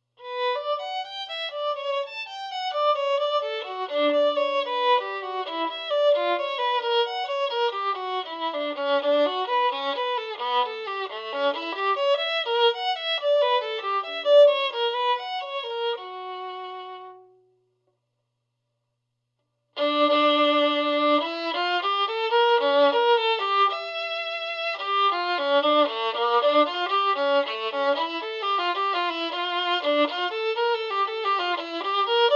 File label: Bach binaural on Lupot calculated